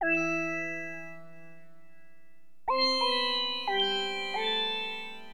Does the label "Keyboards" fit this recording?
Yes